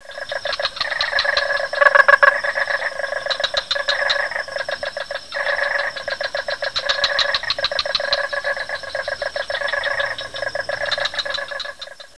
suonerie musica ritmica; rhythm

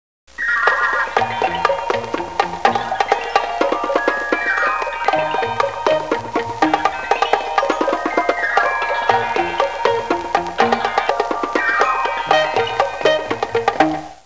suonerie musica ritmica; rhythm

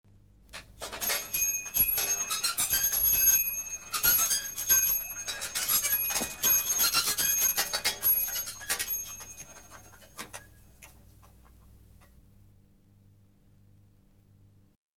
BICICLETTA; trasporti

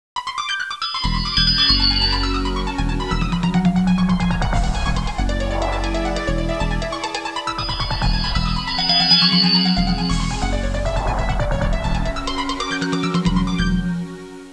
musica elettronica

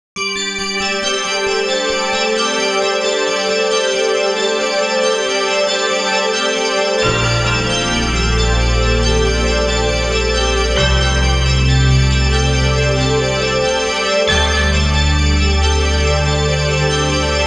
musica newage

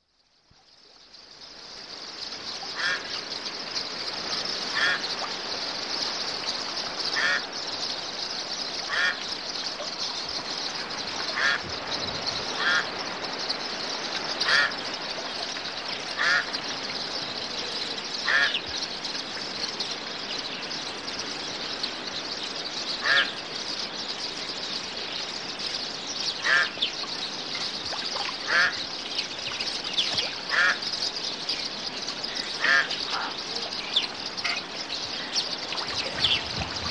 uccelli birds, animali

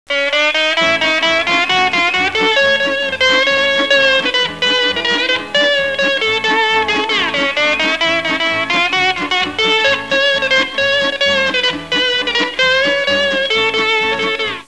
musica strumentale

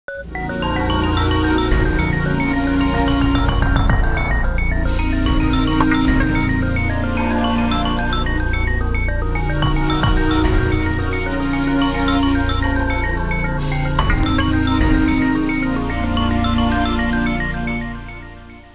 musica elettronica

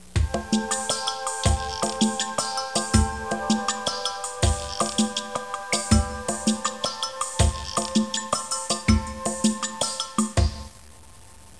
musica newage

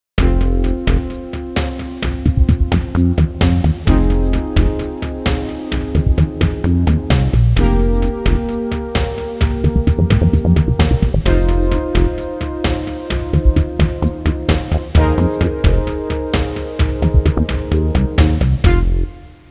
suonerie musica ritmica; rhythm

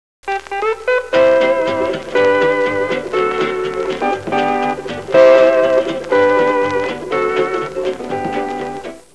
musica strumentale

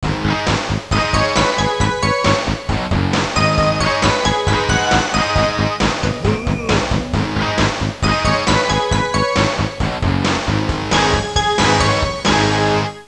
suonerie musica rock

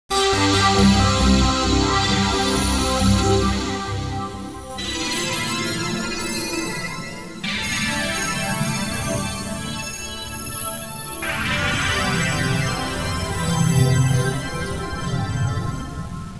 musica tecno; techno

